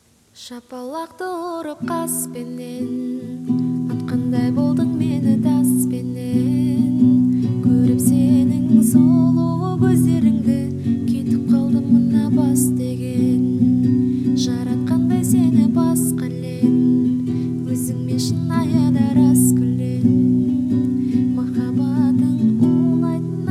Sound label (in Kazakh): gitara kaver